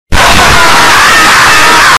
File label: very creepy scary scream